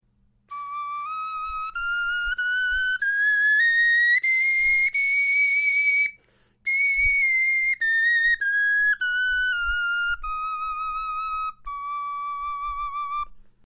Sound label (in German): tonleiter, knochenfloeten